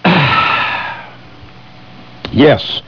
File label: exhale